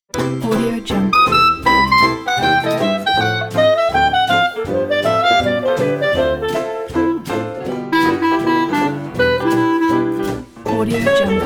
dixieland jazz

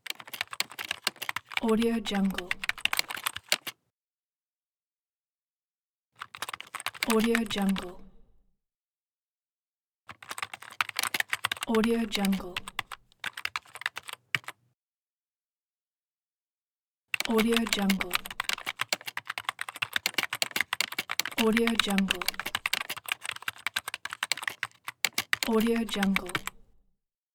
computer keyboard typing

